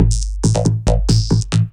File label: Processed Beat, Drums & Percussion